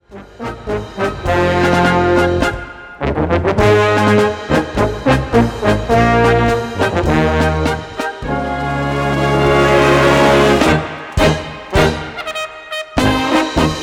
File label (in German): Blasmusik, Polka